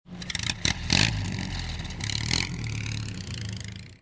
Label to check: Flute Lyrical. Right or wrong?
Wrong